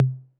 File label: Tom Mid 808 Sat